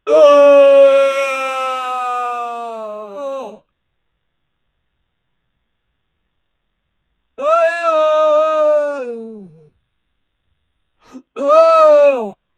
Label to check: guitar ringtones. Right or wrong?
Wrong